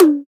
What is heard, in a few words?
TOM